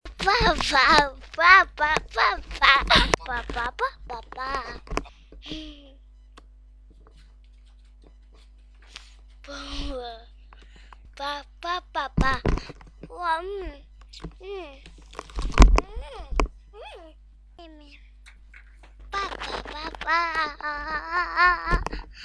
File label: pop